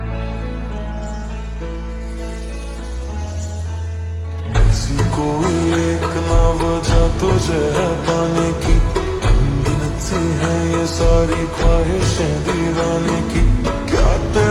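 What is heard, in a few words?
Ringtone Slow Reverb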